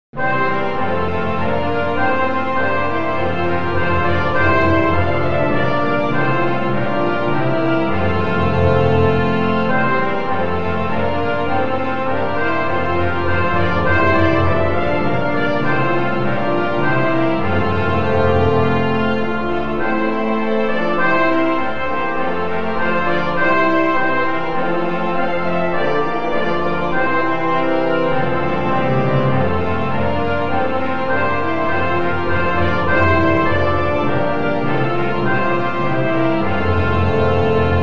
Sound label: Hymn BRASS